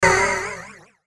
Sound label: Hit Warble; Hit Warble Feedback High; Blastwave